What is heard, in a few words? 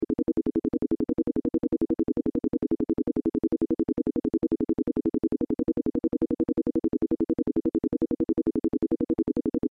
short loops; monopol